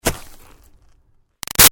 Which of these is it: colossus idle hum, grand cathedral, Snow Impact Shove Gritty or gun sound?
Snow Impact Shove Gritty